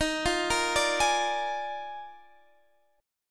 D sharp Major 7th Suspended 2nd Suspended 4th, Guitar, Standard; arpeggiated; x,18,15,15,15,16; JGuitar